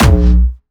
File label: B1, Jumpstyle Kick; vengeance essential club sounds-3; VEC3 Bassdrums